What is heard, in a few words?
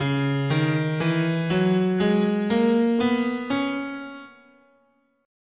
C# Dorian, scale